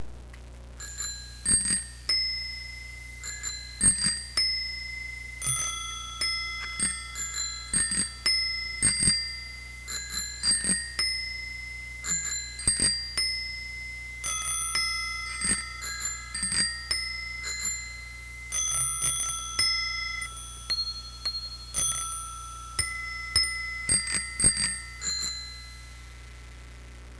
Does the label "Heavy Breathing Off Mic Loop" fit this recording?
No